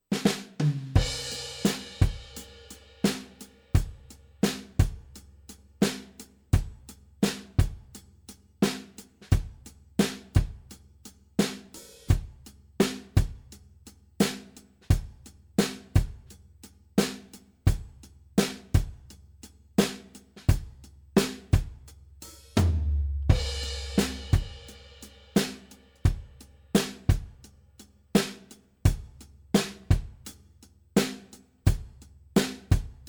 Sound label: Relaxed Rock